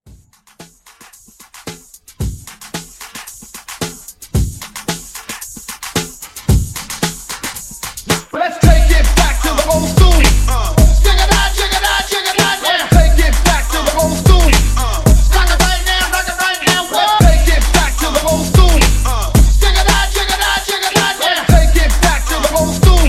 Old Kool Edit CLEAN

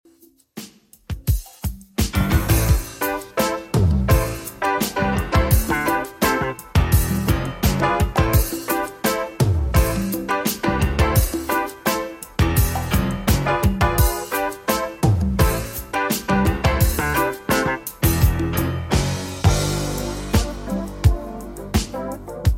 80s Redrum 86bpm

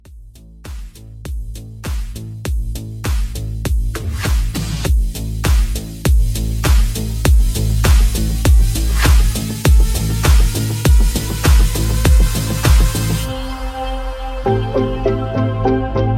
Transition 100 130

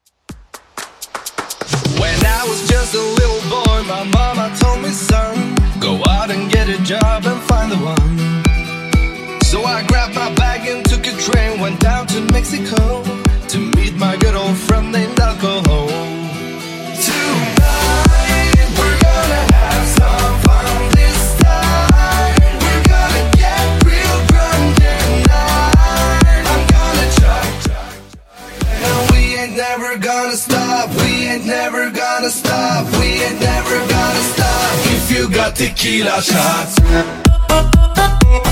Top40 Simple Redrum 125bpm

